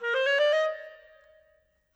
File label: Clarinets, Orchestral; Sonic Foundry (Sony Creative Software); Orchestral VOL-2; Modern 11